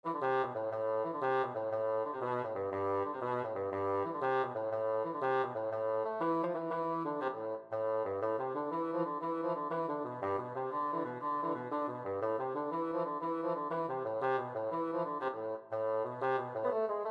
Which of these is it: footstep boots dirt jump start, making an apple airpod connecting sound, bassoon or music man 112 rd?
bassoon